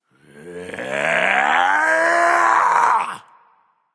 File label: battle cry; smite